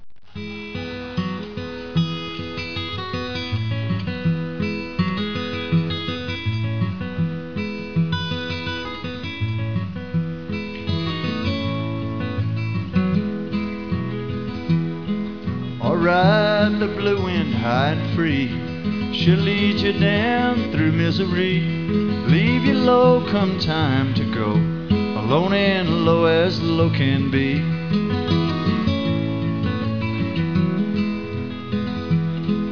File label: blues